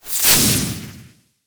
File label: Electric Magic Lightning Zap; RPG; SFX; Cielonos